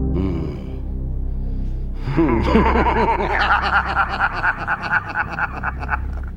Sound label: hmmm